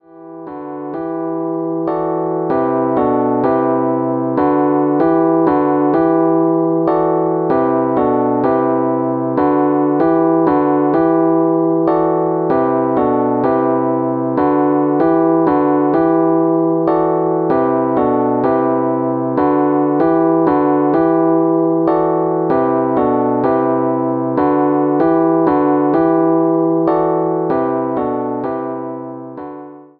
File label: Rhodes chord, hip hop chords; rhodes chord 12